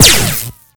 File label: fire